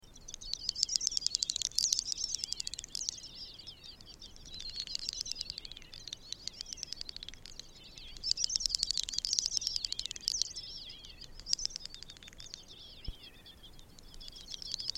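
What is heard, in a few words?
great snipe voice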